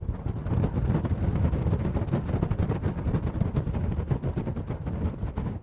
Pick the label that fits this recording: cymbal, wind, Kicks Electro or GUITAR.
wind